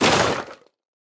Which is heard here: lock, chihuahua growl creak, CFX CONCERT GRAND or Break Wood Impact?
Break Wood Impact